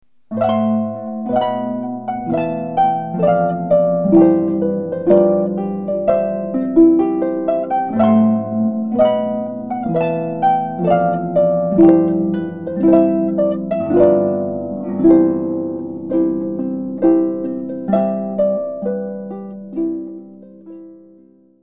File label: Mendelssohn Wedding Recessional, harp